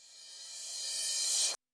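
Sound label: REVERSE CYMBAL, Yamaha SY85 Drums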